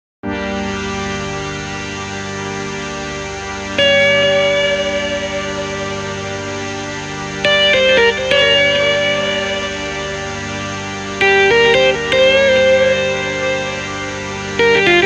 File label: Lexicon PC70 Quadro Echo Strings and Guitar